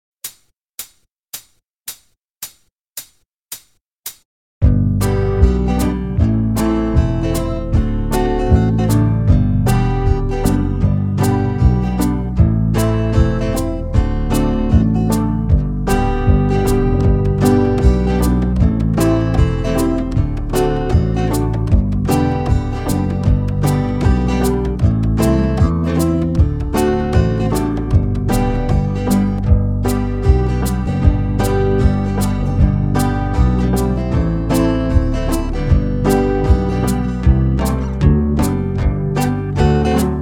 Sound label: key of g normal, banjo backing tracks; dunphy's hornpipe